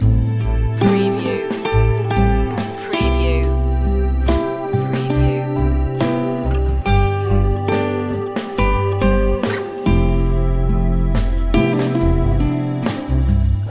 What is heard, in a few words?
mellow guitars loop